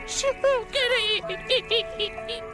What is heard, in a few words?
Crying